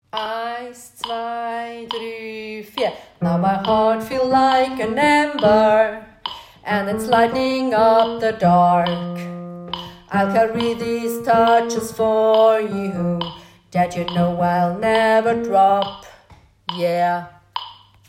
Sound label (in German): Tenor 2 Strophe